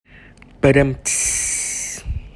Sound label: ba dum tss bad jokes